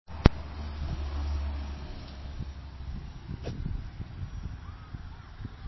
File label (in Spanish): coche